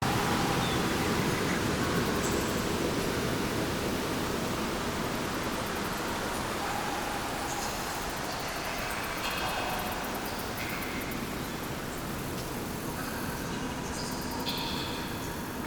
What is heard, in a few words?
Bed Loop, Ambience; Fey Hub